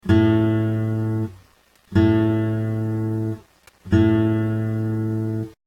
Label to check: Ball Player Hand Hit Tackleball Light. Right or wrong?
Wrong